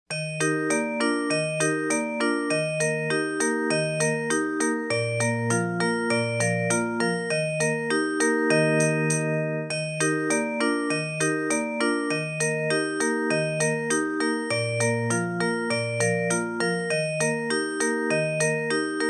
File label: music box 100